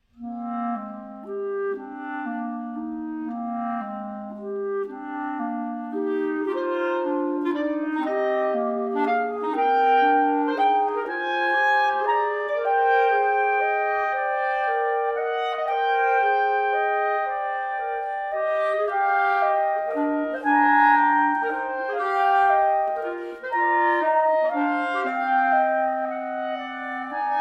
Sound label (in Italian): fanfare napoletane; III. fanfara on Fenesta che lucive